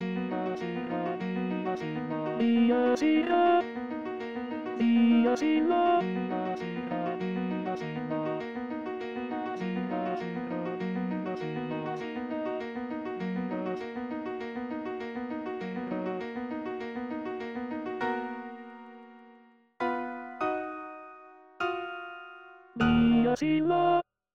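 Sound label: tenor; requiem; Dies Irae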